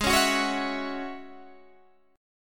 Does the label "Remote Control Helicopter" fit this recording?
No